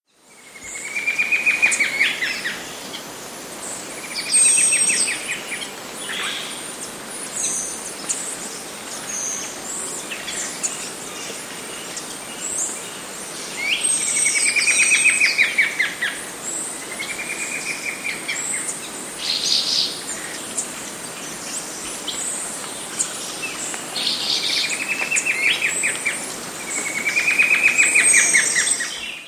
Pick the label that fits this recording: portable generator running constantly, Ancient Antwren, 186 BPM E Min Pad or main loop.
Ancient Antwren